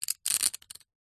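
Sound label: leisure poker chips stack quickly in hand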